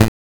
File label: Blip A One Shot; Drum & Bass